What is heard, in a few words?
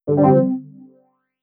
i EQUIP; DungeonJamAssets